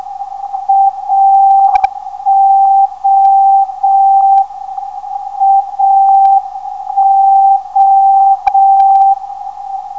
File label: 282kHz, ndb